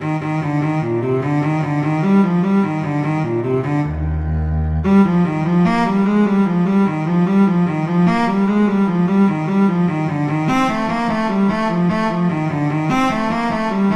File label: Gigue; Bach; Suite 4